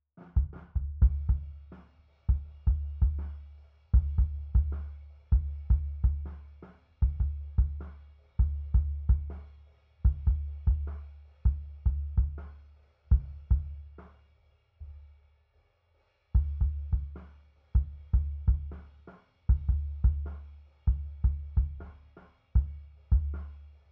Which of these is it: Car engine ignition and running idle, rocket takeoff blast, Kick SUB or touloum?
Kick SUB